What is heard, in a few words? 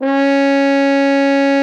bucket C#4; Xsample VOL-3